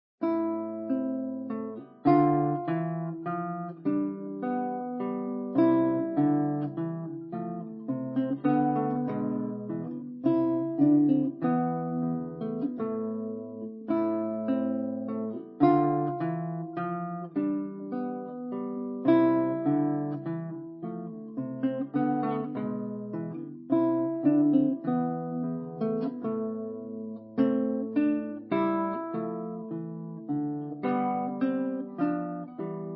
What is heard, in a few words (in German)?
gitarrenmenuett